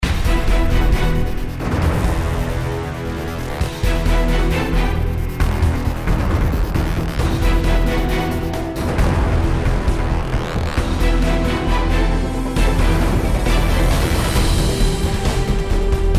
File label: Menu Lobby Option Black Market BGM Loop; crossfire online